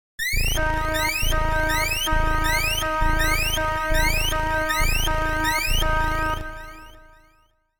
Alarm!

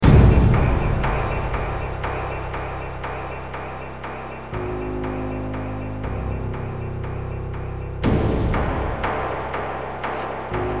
Cutscene Jingle; Infernal; xbox 360

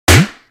Pontoon Pop; Angry Birds Seasons

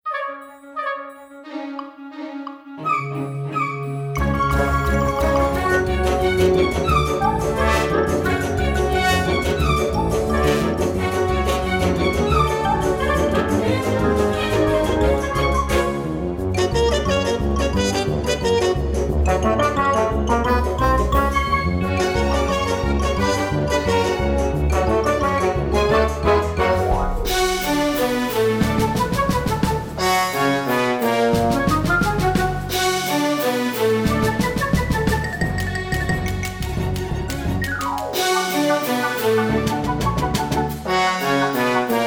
Bgm Ingame

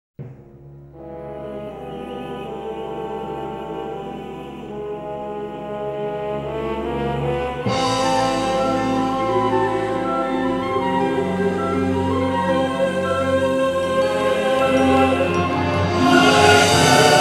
Opening Chorus; Intelligent Qube

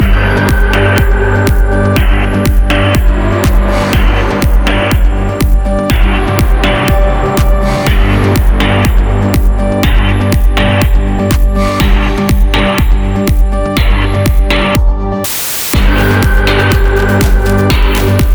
Combat Loop 4-4 122bpm; Combatpart2

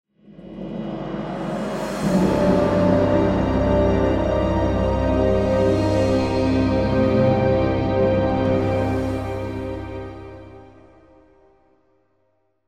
Defeat; Medieval Lords: Build, Defend, Expand

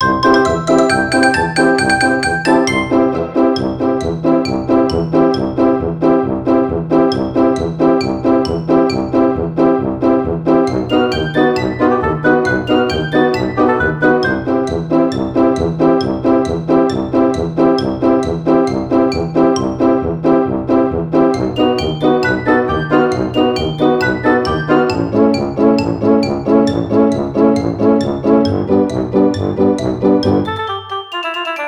Dog Music; Ringling Bros. and Barnum & Bailey; Wii

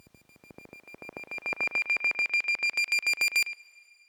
mine warning; Pix the Cat

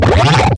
hard robot boss; gonner

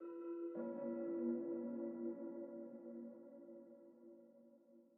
Env Field Day Cave

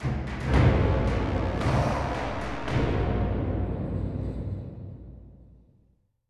Music Fight Vikings Theme Average End; asterix obelix slap them all 2

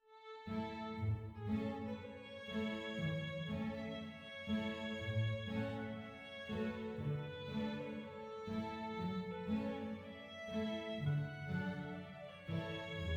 happy soft

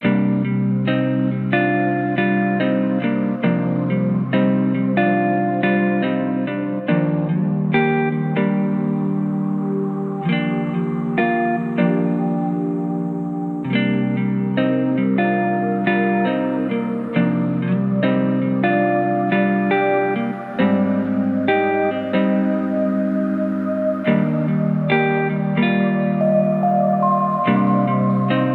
Mus Grasslands Stem; Epitasis

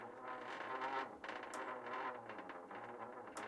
Boulet Creak; Puddle; puddle plus ios gamerip 2018

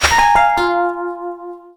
Character Attack

